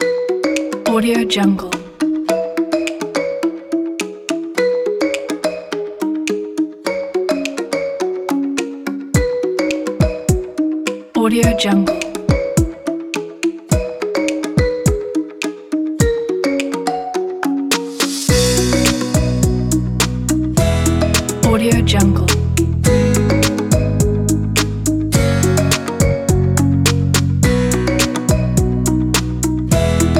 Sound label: funny recipe